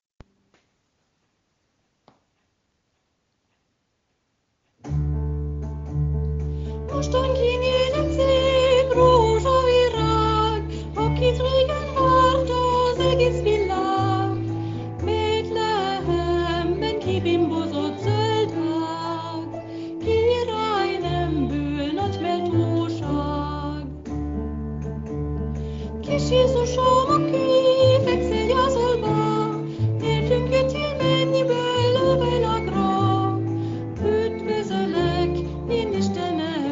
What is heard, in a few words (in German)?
Ungarisches Weihnachtslied